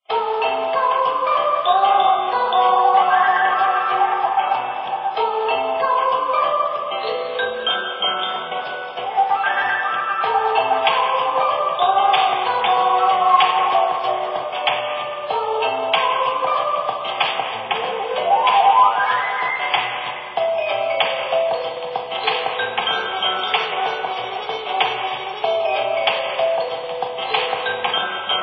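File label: Music Ambient; Saints Row 2; PS3 Windows Xbox 360 gamerip 2008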